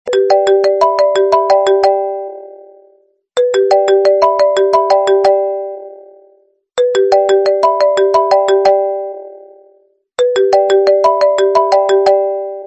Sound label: sms; iphone